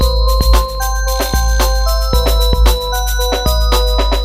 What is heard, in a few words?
Halloween Hip Hop